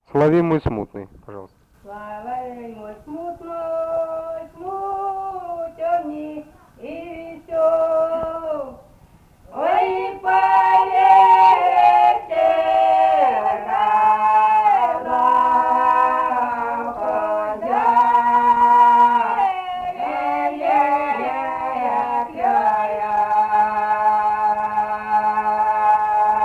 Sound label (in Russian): Соловей мой смутный, Липница